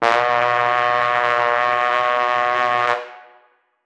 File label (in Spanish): trompeta imperial